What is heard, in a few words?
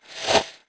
attack, creature